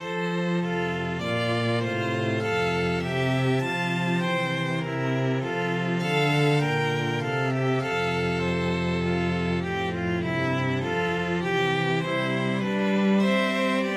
water bouree, 2 violins 2 cellos

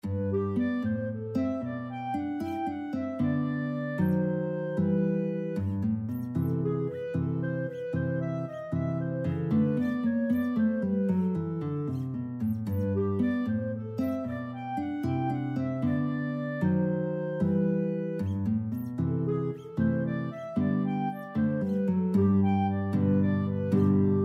duet guitar clarinet; carulli

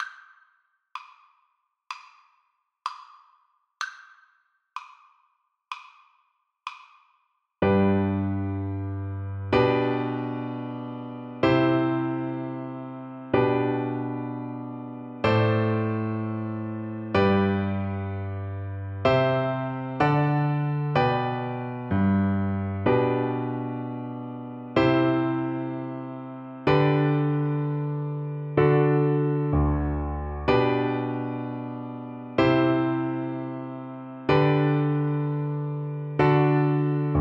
twinkle twinkle, Recorder

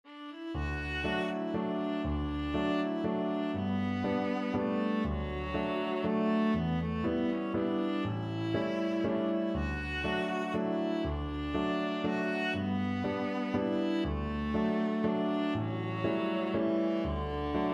viola

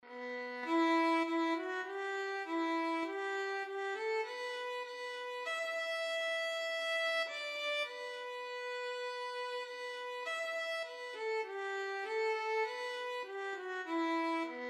violin trio; shalom chaverim

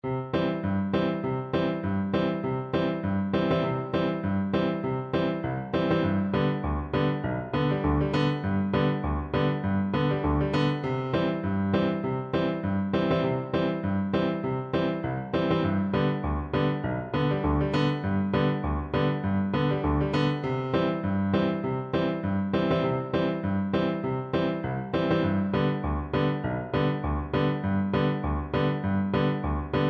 tit galop viola

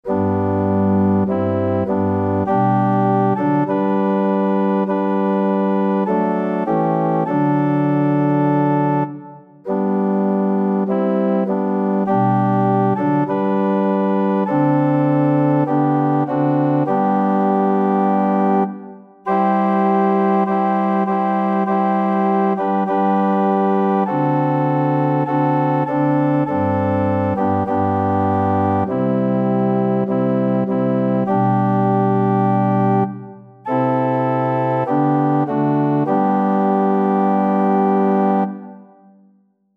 organ

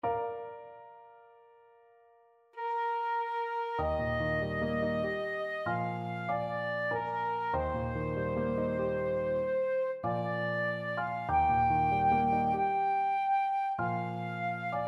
flute

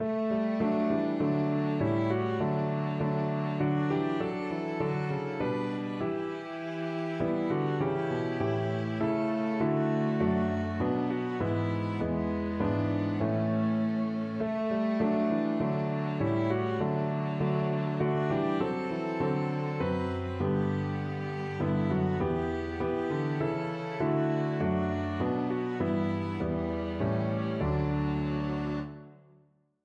away in manger, piano quartet

